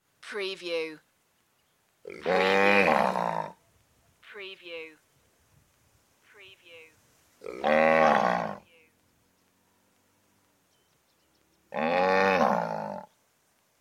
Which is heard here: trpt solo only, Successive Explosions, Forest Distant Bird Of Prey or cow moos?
cow moos